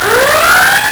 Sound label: LSDj, Nintendo Gameboy; 300 Drum Machines